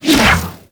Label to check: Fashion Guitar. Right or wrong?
Wrong